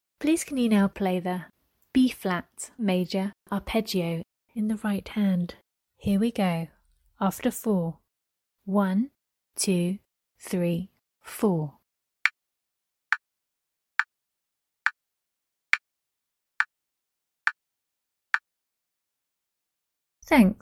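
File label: b flat maj arp right hand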